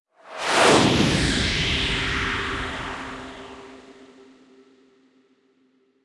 ui collect Spoil War

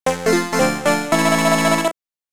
Extend music; Hopping Mappy

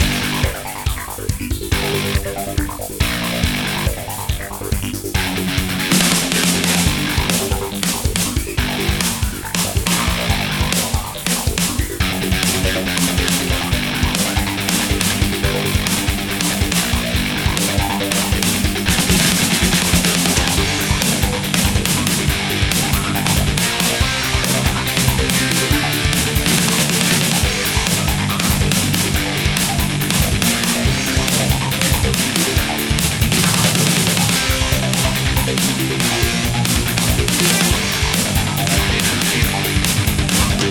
Mechanic Looped; Dual Blade: Battle of the Female Ninja

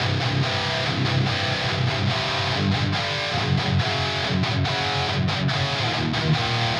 Drums and Jingles Explore - Guitars; Metal Tales Fury of the Guitar Gods

